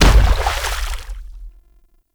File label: Infested Femur Step Water; Warframe